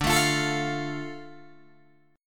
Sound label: D Minor, Guitar, Open D